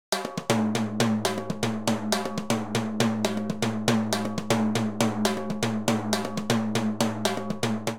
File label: Samba 1 Timbales 120bpm; Latin Percussion; Ableton; Factory Packs